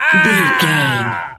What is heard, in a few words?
voice male hurt pain